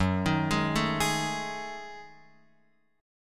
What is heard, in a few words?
Gb Minor 6th Add 9th down Guitar Open F; arpeggiated; JGuitar